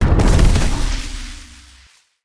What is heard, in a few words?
Ammo Crate Destroy